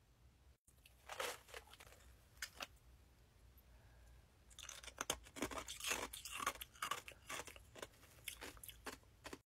Chewing ice

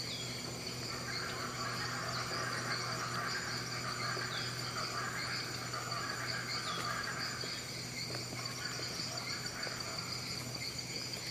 Speckled chachalaca; پرنده گوش خراش خالدار

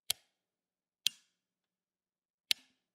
Lamp on off switch; کلید روشن خاموش لامپ

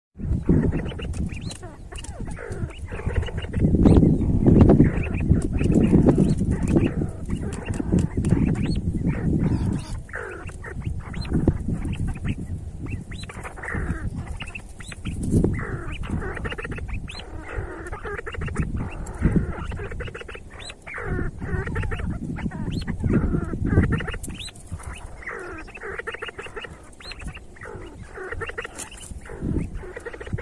See See partridge sound

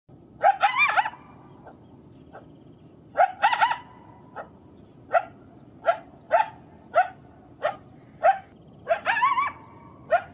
Coyote; زوزه; کایوتی

